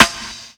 SD, Hip-Hop & R&B; USB Soundscan vol.47; AKAI

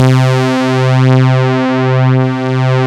Synth Bass, Analog Bass

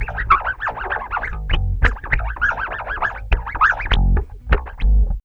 BASS, Loops; East West; Funky Ass Loops

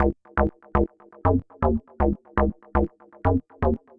SH101 Bass Loop (120BPM), retro house

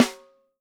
WOOD, Living Drums; Sampleheads; Peter Erskine Living Drums

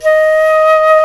jazz flute

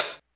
hihat, Hardcore Breakbeat; Big Fish Audio; Hardcore Breakbeat Action; Rhythms 4 Life 151 Ebmin

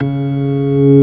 ORG.3 CIS3; B3 FULL

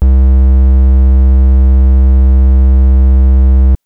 Synth Triangle c1; TrashLibrary

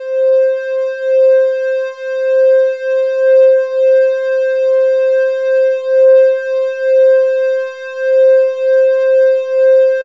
Synth, Raw Analog Multi's; Dream Zone

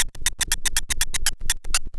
SCRATC; Best Service; Advanced Mega Effects

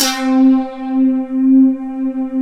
METALLICS; Pro-Rec - Synthsonic; TRINITY

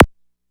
DR660 DANCE, DRUMS PERC; BOSS; Rubber Chicken Software Sample Bank

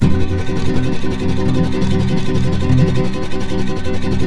C# M, Guitars; Spectrasonics; Hans Zimmer Guitars VOL-2; FLAM TREM CH

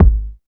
THWK KICK; East West - Phat & Phunky

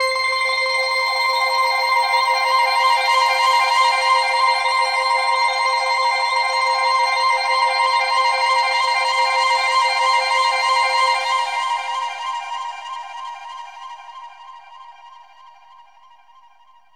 seq atmos; AMG - Vince Clarke Lucky Bastard

